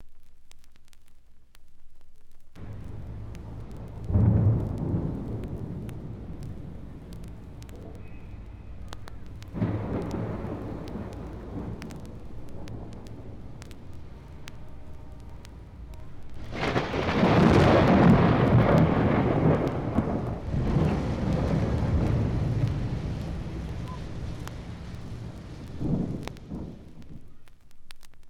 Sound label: Thunder